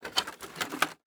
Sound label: Wood Handle; Survival Game Sounds - Lite Edition; Environment